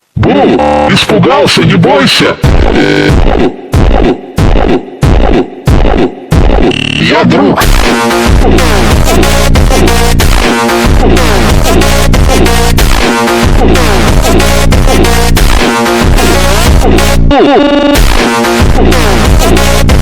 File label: ultra slowed